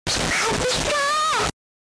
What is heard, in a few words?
Pikachu SFX; RBY